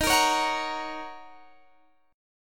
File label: F sharp Major D sharp; strummed; F#/D#